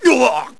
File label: duwaah; rolent